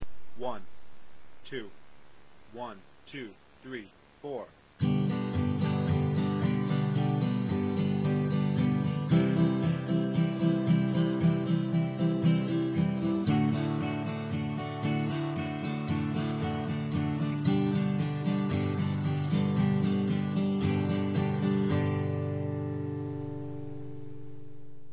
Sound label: strum, guitar acoustic